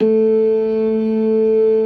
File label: LOW MAN, ORGAN; InVision Interactive; Keith Emerson; ORGAN SPLIT